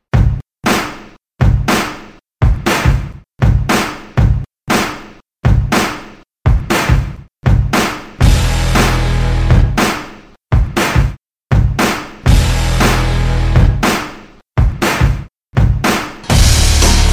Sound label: Beat